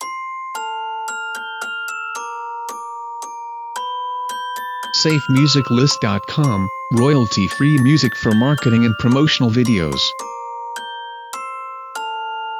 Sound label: We Wish You A Merry Christmas Short Intro